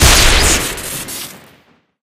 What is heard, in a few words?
spy revolver crit, weapons; enforcer; tf